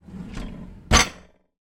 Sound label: household crockery drawer open